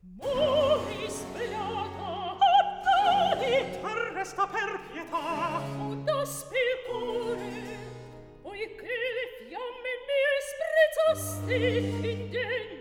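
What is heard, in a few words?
Recitativo, Handel opera; Act 3; Mori svenata; Handel - Rinaldo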